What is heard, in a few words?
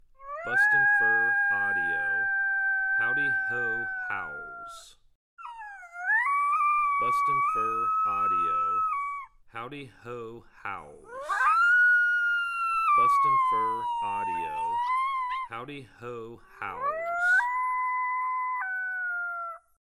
Howdy Ho Howls